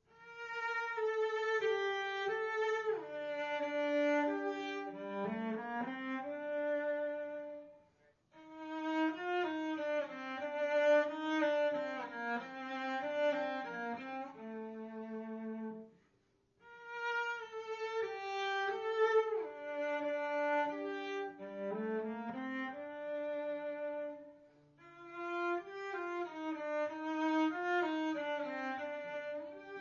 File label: Minuet G minor, cello